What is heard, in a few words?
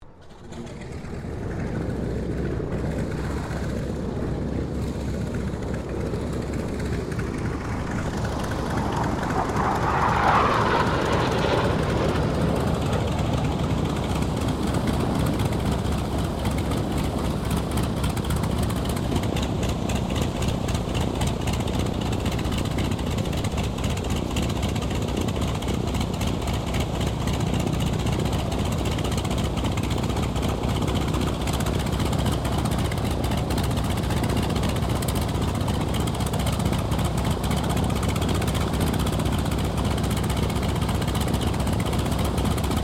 Mustang Kittyhawk startup Sabre pass, stereo; Mixed aircraft squadrons; Airplanes (Sound Effects:Vehicles)